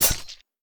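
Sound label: Dagger Hit Top to Bottom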